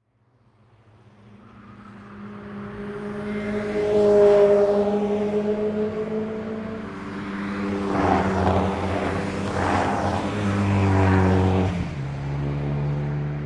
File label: pass by sports car multiple; sonniss gdc